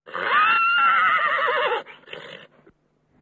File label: Horses High Pitched